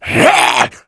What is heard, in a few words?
zombie pain